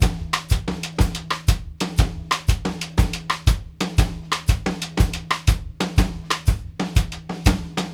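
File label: 6 8 TOM RIM, Drumworks; Sampleheads; New York City Drumworks VOL-1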